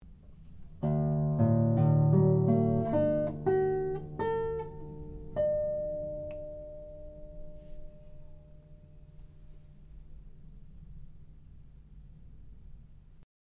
mic behind me

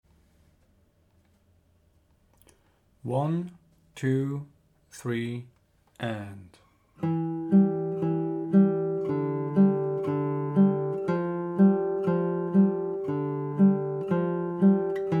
60bpm, guitar

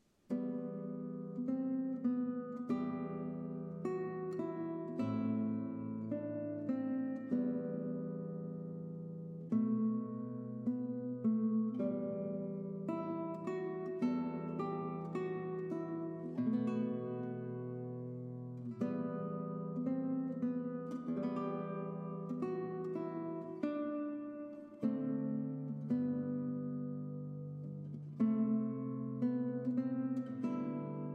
Adagio in E Minor Dynamics; Mertz

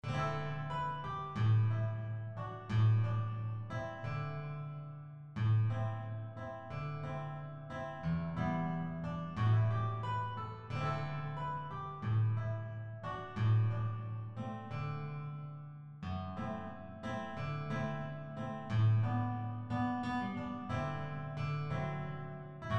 Bossa

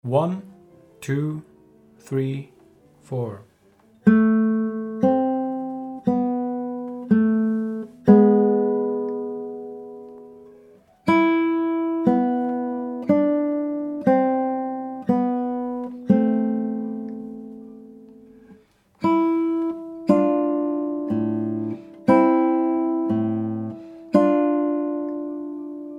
60bpm, guitar

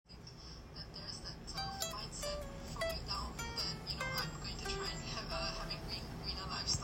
slurs

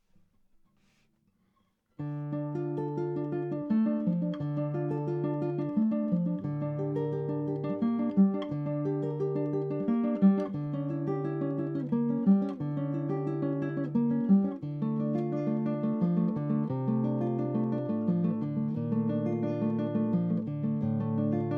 Präludium double Ribbon

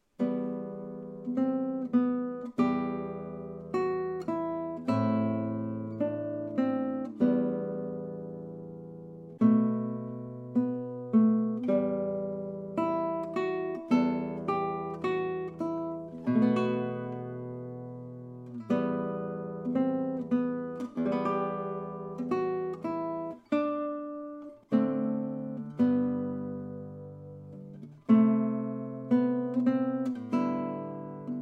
Adagio in E Minor; Mertz

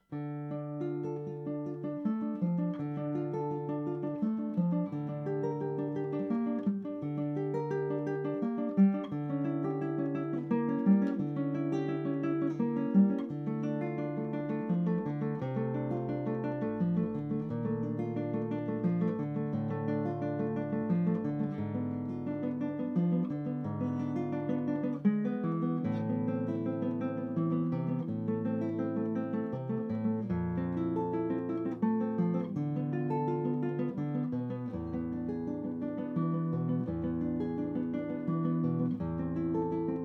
prelude ribbon Blumlein setup

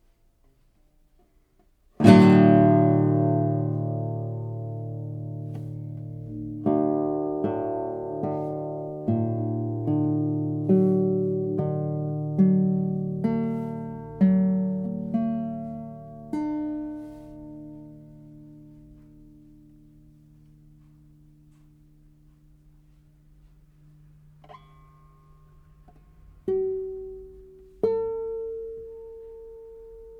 In front 60 cm

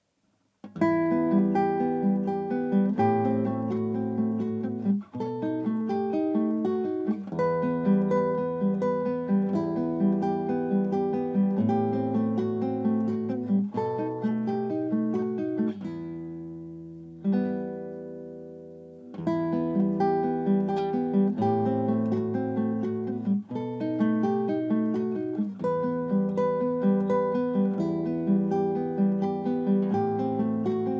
study in e minor; Tarrega

